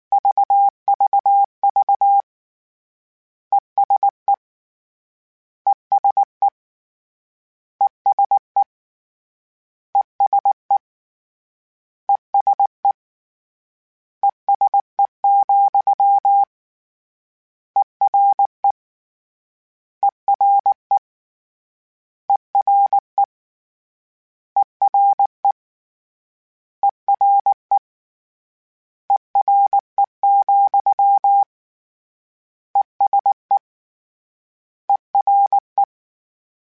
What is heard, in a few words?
CW Code Groups Suffixes, ESE ERE